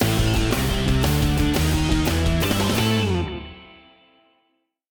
End Level Sting; Ben 10; switch gamerip

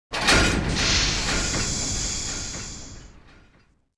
Railmove; Gary Grigsby's War in the East

